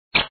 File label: slide